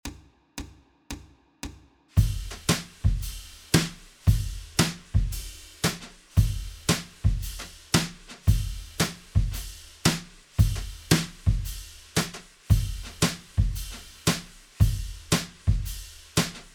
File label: Swing Drum Beat